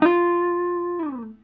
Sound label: GUITAR LICK; Ueberschall; Jam Box